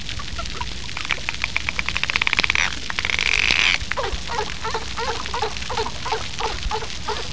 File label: click